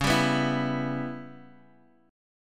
C sharp Minor 7th Flat 5th, Guitar, Half Step Down